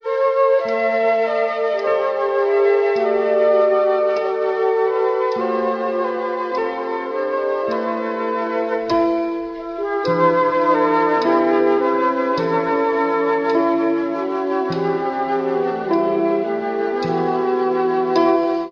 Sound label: flute with piano, Loops, Sad